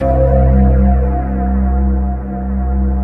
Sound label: C2, Synth; Big Fish Audio; Synth City; ARGONOTE